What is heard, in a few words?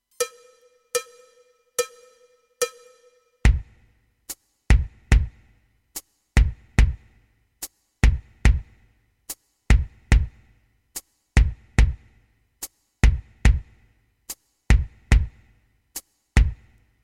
latin elementar, rhythmen